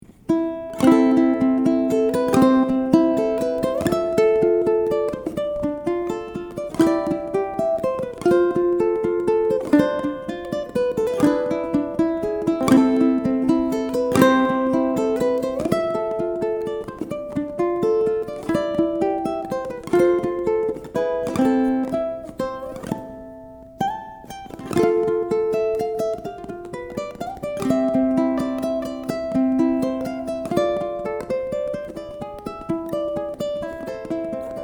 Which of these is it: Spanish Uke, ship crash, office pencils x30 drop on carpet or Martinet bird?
Spanish Uke